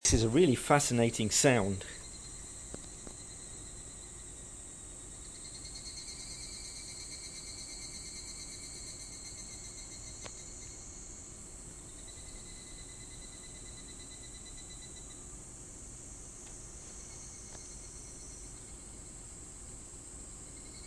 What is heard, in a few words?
north kyoto insects